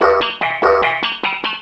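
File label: mbira loop, 148 bpm, rhythm loops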